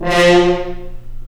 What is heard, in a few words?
BRS F.HRN, FHns Mutes; Roland LCDP06 Brass Sections; F.Horns FX+